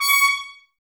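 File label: Brass trumpets C#, Mute; Miroslav Vitous - Woodwind Brass Ensemble; Tps Mute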